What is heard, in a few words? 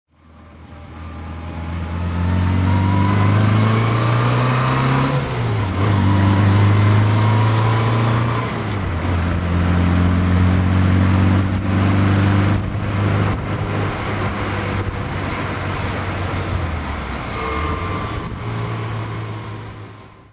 Sprite drive